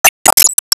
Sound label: Player Pain Damage